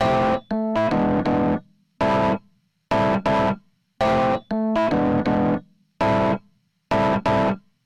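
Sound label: epiano, Hybrid neural guitar overdrive; souldriven; Morphdrive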